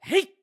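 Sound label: Attack Two Handed, voice; Link attacking (any two-handed weapon); BOTW; Breath of the Wild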